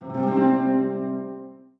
guardian; weapons; dota; omniknight

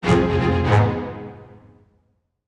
level up; ui; economy; underdraft

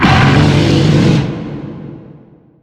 savage roar; weapons; dota; lone druid

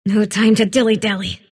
sylph move pain; dota; dark willow

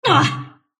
sylph pain; dota; dark willow

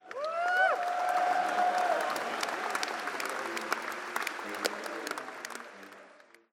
crowd; dota; spectator

